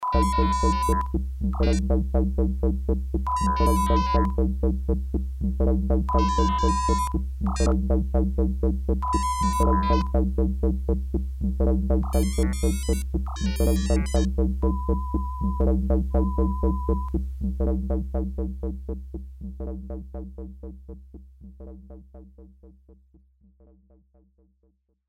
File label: sine wave fade